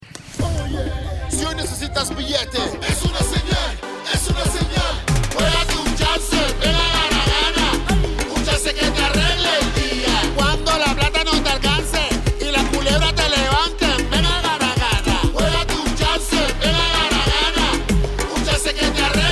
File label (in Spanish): cancion salsa choque mezcla